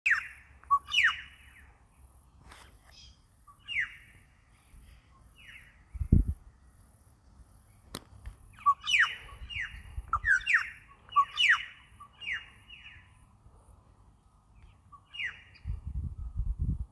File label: Sphecotheres Vieilloti; Australasian Figbird; Call